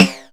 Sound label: Flange Snares; Percussion; Best Service ProSamples vol.37; Dance Synths